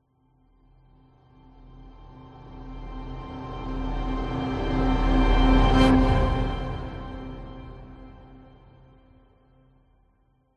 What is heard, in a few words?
sound design cinematic orchestral music riser dramatic horns strings; zapsplat